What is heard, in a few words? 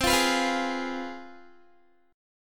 C Major 7th Suspended 4th Sharp 5th, down, Guitar; CM7sus4#5 strummed